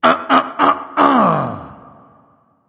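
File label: nonverbal clear throat; smite; voicepack; ullr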